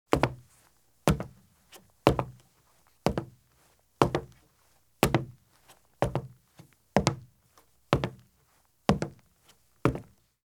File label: Running Shoes Walk at Slow Pace on Wood Porch or Footbridge; Sound Ideas; Footstep Sound Effects